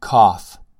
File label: cough